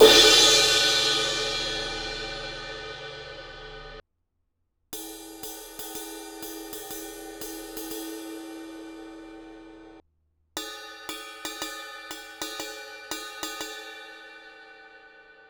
sabian 18 inch hh hand hammered medium thin crash ride bell